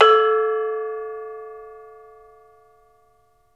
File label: METALOPHONE; E-MU Producer Series Vol. 7 – Old World Instruments